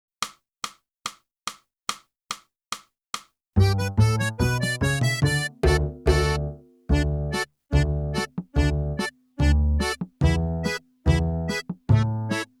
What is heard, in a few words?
flute, the college hornpipe; (Morgan) - Boosey Woodwind Method: Flute (Book 2)